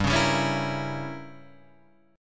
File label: G Diminished 7th